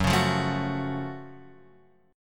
F sharp Minor Major 7th F sharp Guitar Open F; strummed

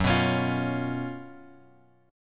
F sharp Major 7th Suspended 4th; F#M7sus4 Chord {2 2 X X 2 1} strummed

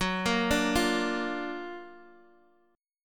F sharp Augmented Major 7th F sharp Guitar Open D; x,x,4,4,5,3

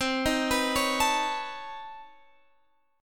B 9th C down Guitar Standard; B9/C arpeggiated; JGuitar

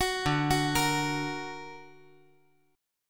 D Augmented, chord

